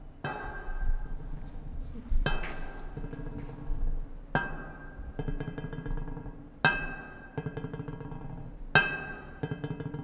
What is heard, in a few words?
Metal Pipe Along Concrete